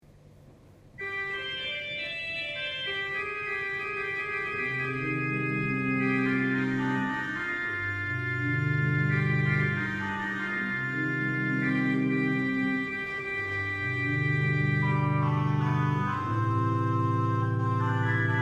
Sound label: cromorne